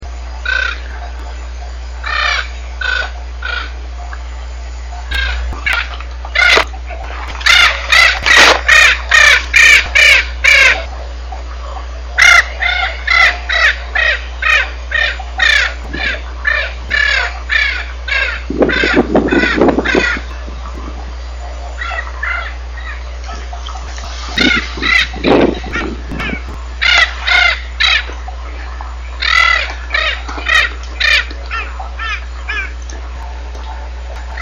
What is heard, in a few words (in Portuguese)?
Ararinha azul som